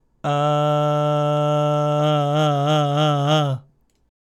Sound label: vibrato C a slow